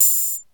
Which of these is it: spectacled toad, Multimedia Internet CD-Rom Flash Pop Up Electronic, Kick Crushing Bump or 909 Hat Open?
909 Hat Open